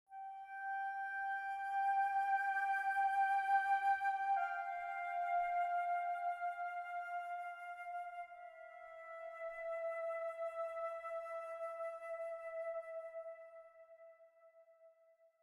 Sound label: Flute 58bpm 4-4